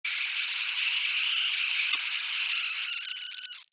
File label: Bug-Like Synths; Blastwave FX